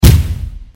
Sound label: cockroach destroy